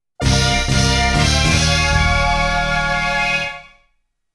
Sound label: RESULT (STAGE CLEAR); dunk dream gun hard